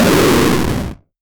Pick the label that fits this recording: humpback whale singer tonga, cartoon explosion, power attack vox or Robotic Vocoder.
cartoon explosion